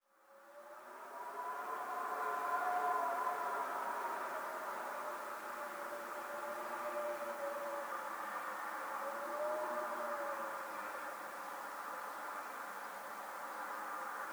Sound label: howling wind